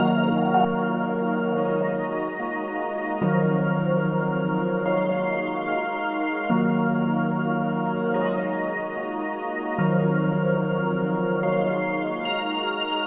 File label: no bells; Loops; Electronic